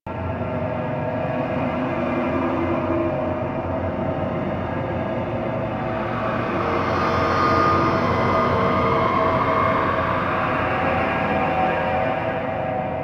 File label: Asteroid Pipe Tunnel Amb Loop; Warframe; Ambience; Grineer Asteroid